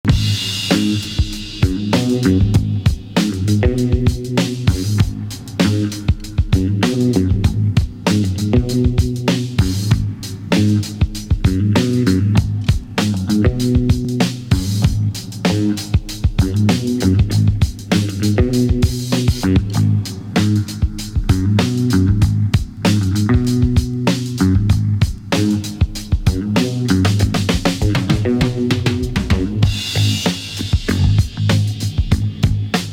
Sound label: Funky Axe